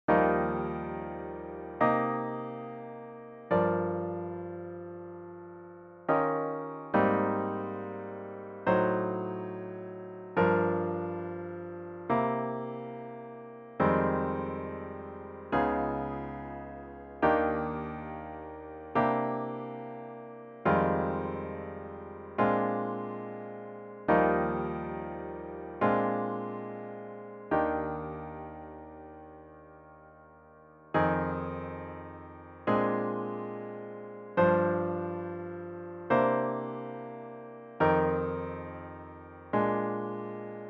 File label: solo piano